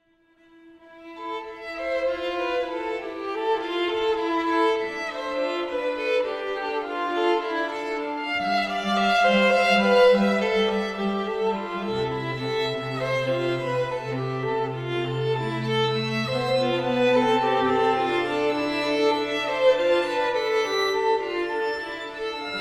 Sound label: String Quartet; Manchester Collective; String Quartet No. 2